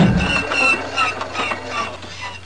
Malfunction; Kongbots 1.0

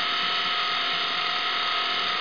wheel

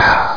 med kit; Giza

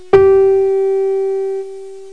piano, notes; ClickArt 300,000

